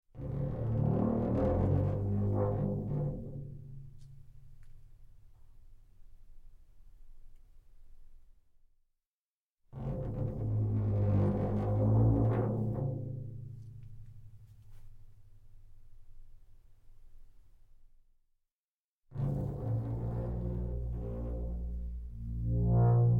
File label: Instrument Floor Tom Drum Friction Mallet Large Vibrate; OmniMic; Guild Wars 2 (Original Foley Soundtrack)